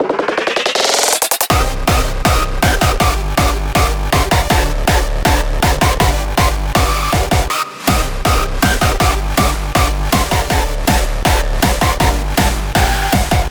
הארדסטייל

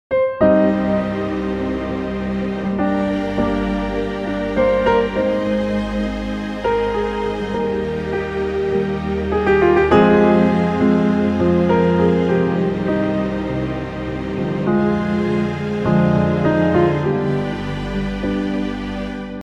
מרגש

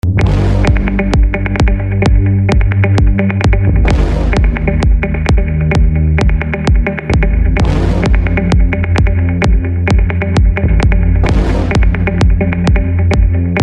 אתגר הצלצול

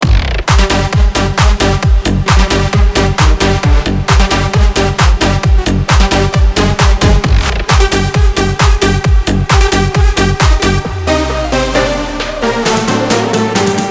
רינגטון

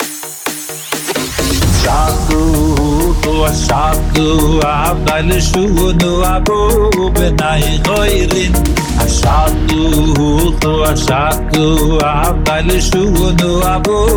רמיקס

